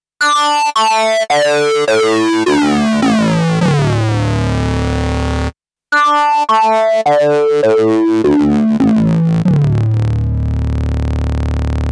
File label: vc lfo